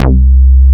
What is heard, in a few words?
BAS, Eurotech; Sounds Good